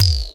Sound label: Percussion